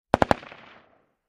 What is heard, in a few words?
Mini 14 Machine; Rugar